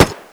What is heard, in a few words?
m3 supergrease; WAR